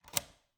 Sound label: Cabin, front door, deadbolt, lock, latch, thick, open, hyper; Eiravaein Works; Vaeyan IV